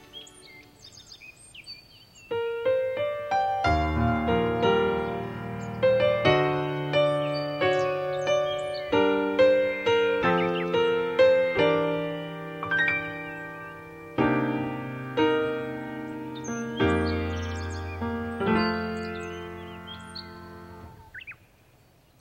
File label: Piano Prelude